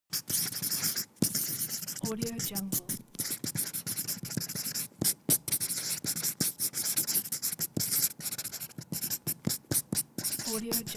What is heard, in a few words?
writing marker fast speed